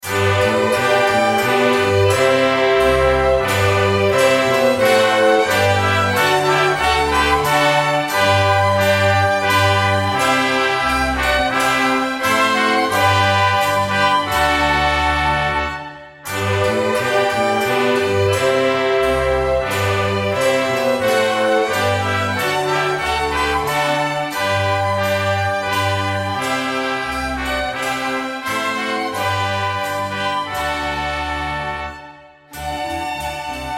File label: menuet